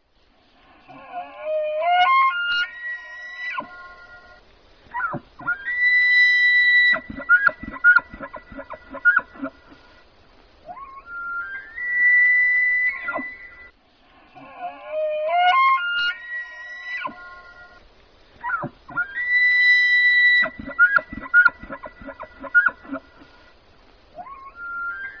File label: Elk Call Loop